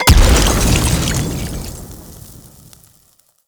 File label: Oil Grenade Explosion; Vehicles; Abilities; Warframe; Nechro Mech